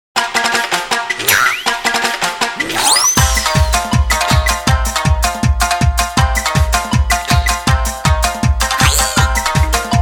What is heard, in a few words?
Polka